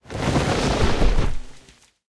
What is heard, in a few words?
action forest attack